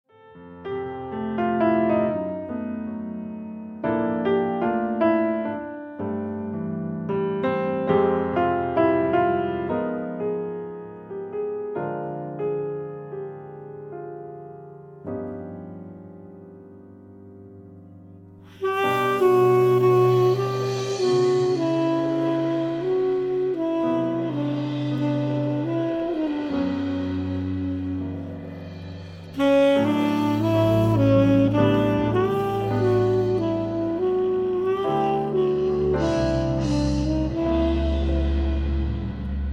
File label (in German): schlaflied